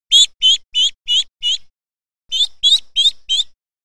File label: Ducks Chirps A Baby